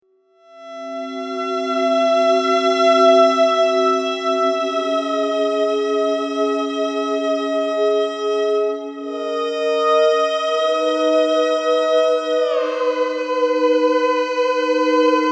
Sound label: Plaintive tune with a couple of voices in ghostly accompaniment, Miniature Theremin; ringtones